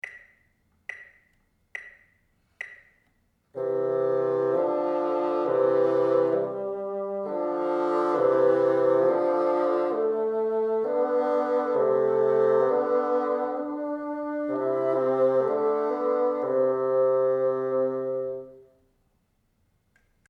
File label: together, bassoon